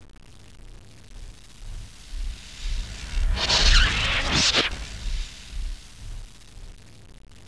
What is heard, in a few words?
zap; q3